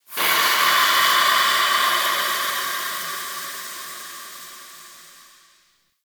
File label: Steam Blast; Ambience; Warframe; The New War; Zariman